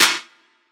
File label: clap single shot c sharp key, Royality free hand clap tuned to the C# note. Loudest frequency: 3841Hz; single hits one shots